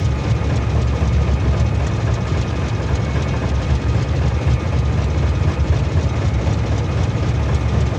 train engine; factorio 2.0.55

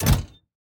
metallic chest open; factorio 2.0.55

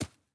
grass, walking; factorio 2.0.55

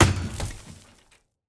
car tree wood impact; factorio 2.0.55

